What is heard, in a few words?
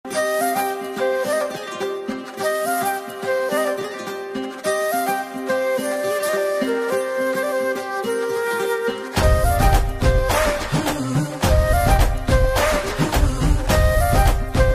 love bgm ringtone; amaran; sai pallavi